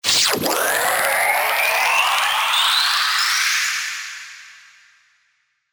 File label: Uplifter; SERUM FX